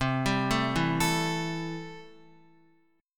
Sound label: C 6th C down Guitar; arpeggiated